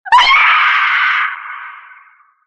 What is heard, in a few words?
scream; green goblin